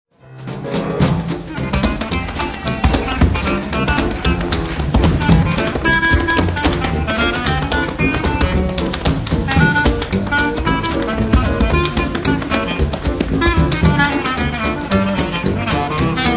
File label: Trad Jazz; teaching listening